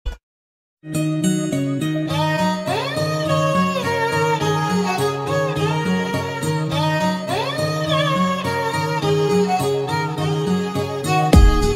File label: bgm ringtone south bgm ringtone tamil ringtone telugu ringtones